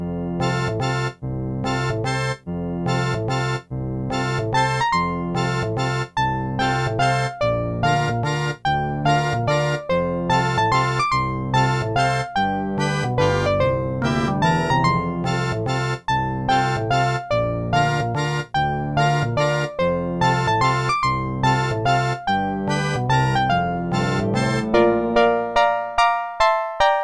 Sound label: waltz; The Music Studio; MIDI